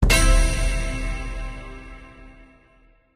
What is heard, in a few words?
scatter fly end